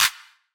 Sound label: Big Clap A Key, Royality free hand clap sample tuned to the A note. Loudest frequency: 4999Hz; percussion